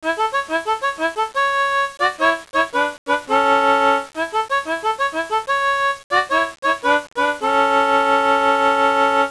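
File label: harmonica, band; My Animated Word Book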